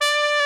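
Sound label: brass bright trumpet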